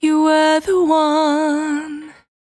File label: Cry 68bpm B, Vocal; Zero-G